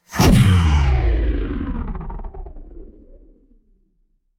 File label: magic scifi laser